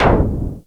NOISE FX; Back In Time Records; Big Bang; SFX

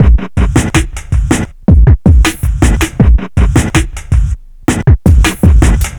Street Tip, R&B Drums

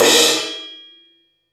cymbal stroke, deep house single hits

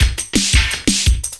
loop, 170 BPM, drum & bass; Ueberschall; Drum & Bass Flash Trackz

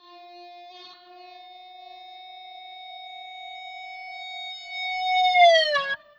guitar, C guitar

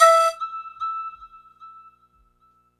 Chiff single note, Keyboards

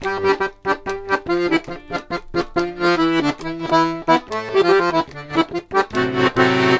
Accordions; USB Soundscan vol.40; AKAI

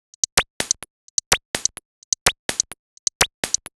Hihat 127bpm, House Drumloops

